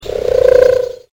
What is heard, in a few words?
wood pig